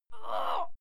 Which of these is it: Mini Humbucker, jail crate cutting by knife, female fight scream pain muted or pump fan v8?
female fight scream pain muted